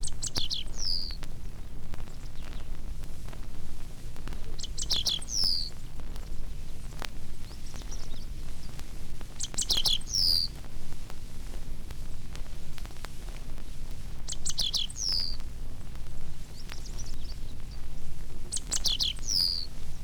Emberiza jankowskii song; passeriformes; emberizidae

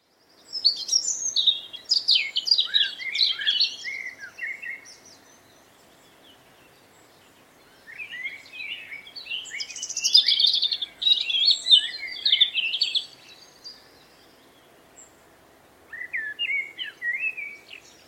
Erithacus rubecula song; passeriformes; muscicapidae

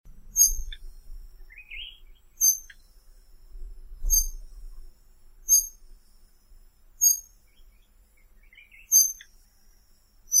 Monticola gularis call; passeriformes; muscicapidae

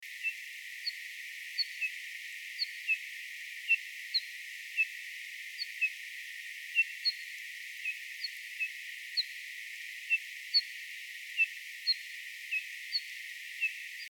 Monticola saxatilis call; passeriformes; muscicapidae

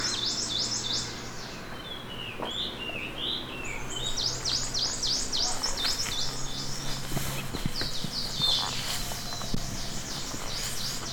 Muscicapa ruficauda song; passeriformes; muscicapidae; ficedula ruficauda

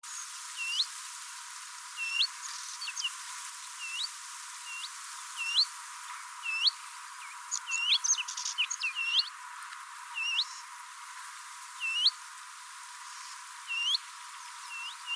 Phylloscopus trochilus call; passeriformes; phylloscopidae

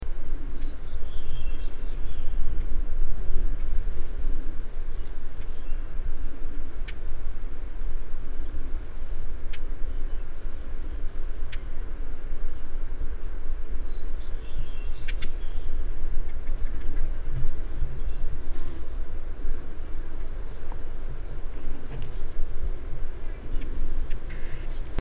Hippolais languida call; passeriformes; acrocephalidae

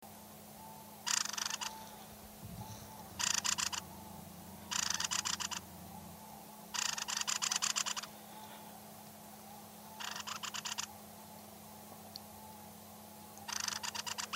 Turdus viscivorus call; passeriformes; turdidae; birds of the ussr

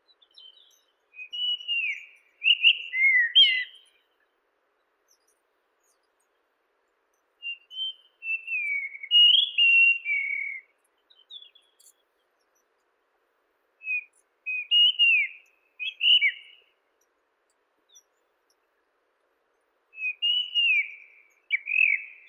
Monticola gularis song; passeriformes; muscicapidae

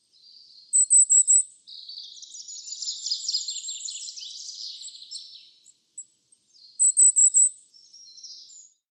Regulus ignicapilla call; passeriformes; regulidae; birds of the ussr

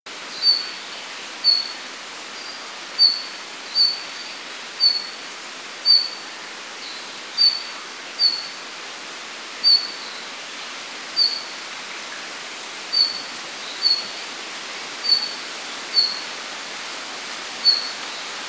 Tarsiger cyanurus call; passeriformes; muscicapidae

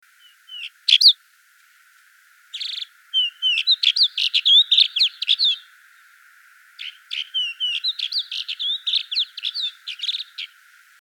Prunella collaris song; passeriformes; prunellidae

